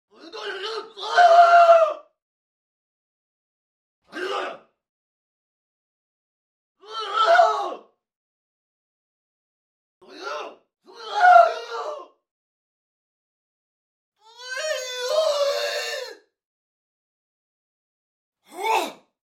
Vocals (Male), Martial Arts and Human Impacts; Hollywood Edge

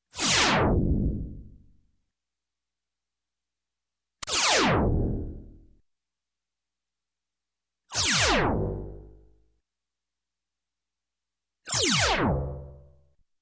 Space Laser Shot, Electronic; Sound Ideas 2000 Series

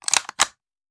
Machine Gun Foley; Sound Ideas; Guns

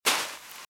Footsteps Sand Dry Walk Right; RadwinIsland

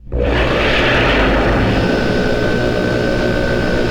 Door Large Metal Loop; Doors

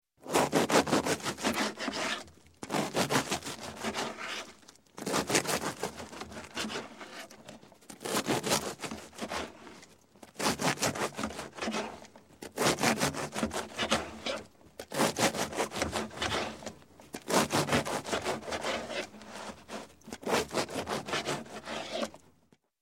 Cutting, Knife Slicing Bread, Food; Sound Ideas 2000 Series

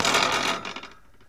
Street Ball Wood Back; SFX Kit; Street Basketball

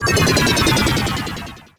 Scifi Synth Tone Powerdown; Energy & Forcefields

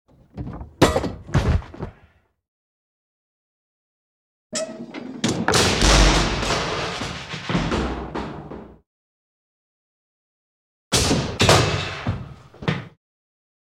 Trapdoor Snaps Open; Elements; Sound Ideas; Series 2000

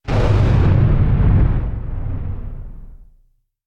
Impact Heavy Destruction Industrial Hit; Hollywood Edge; sound designer's toolkit v2

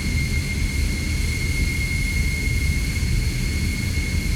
Jet Engine; AudioSpeed

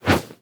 swoosh low; Blind Gladiator

